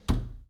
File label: Foosball Center Slide